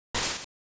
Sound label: Battle Open; Pokemon Essentials; Pokemon Opalo